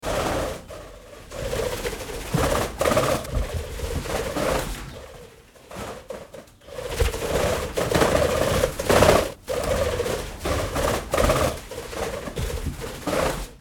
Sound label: Bird Flying